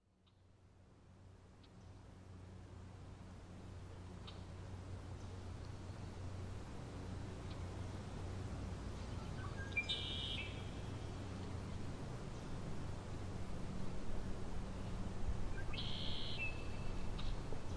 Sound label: frogs, The Frog Chorus Tunes Up On Grafton Lake